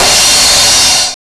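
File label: hi-hat, Hard & Loud Techno; USB Soundscan vol.01; AKAI; HH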